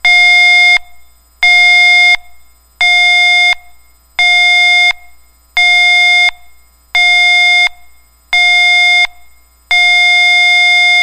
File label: special warning